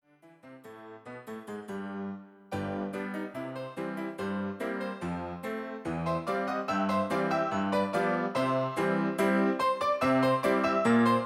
the entertainer música de salida

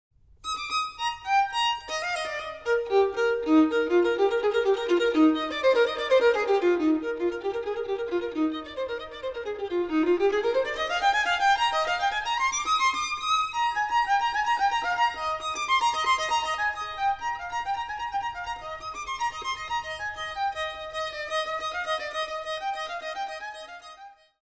violi

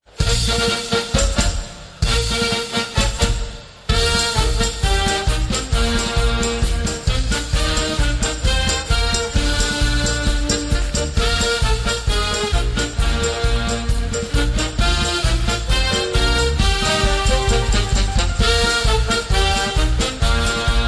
Musica para circo

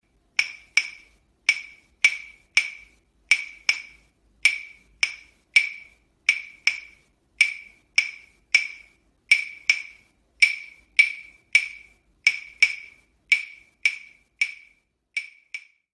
Claves

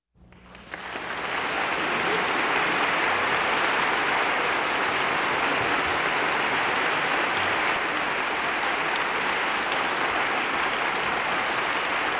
Ovación Mucho Público

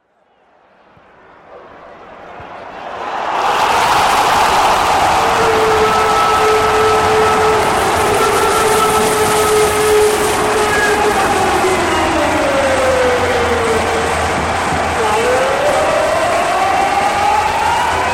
futbol goool y Megafonia

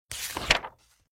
Efecto de sonido cambio de hoja

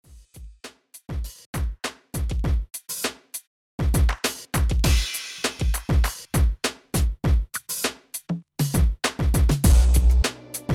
rap

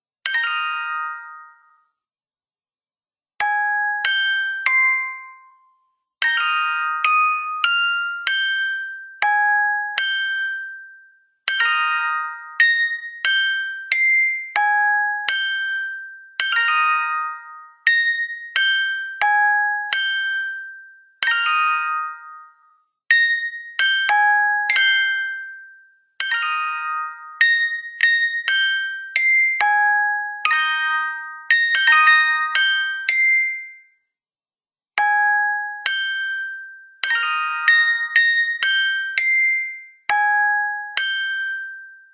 Caja Musical; El Conjuro

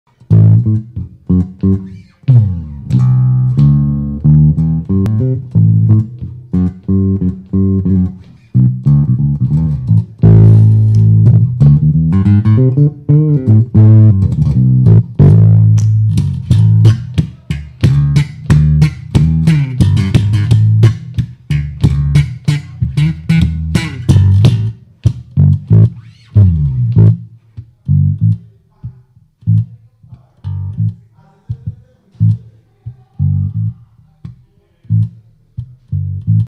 bajo yamaha trbx305 5 cuerdas activo demostracion de sonido

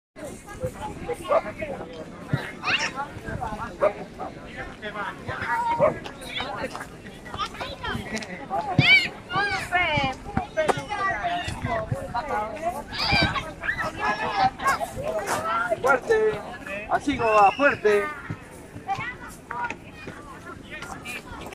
Parque

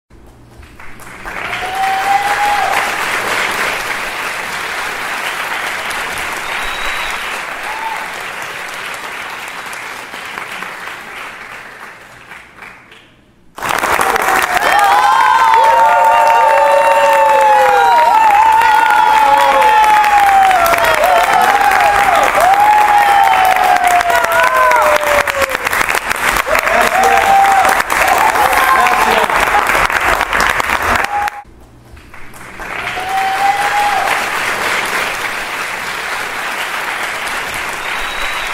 aplausos y gritos del público applause and shouts from the public

